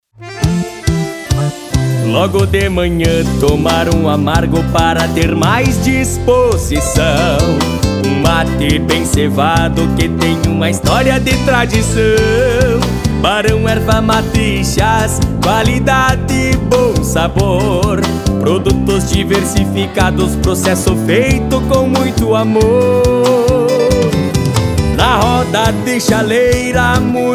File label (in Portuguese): Jingle Erva Mate; Barao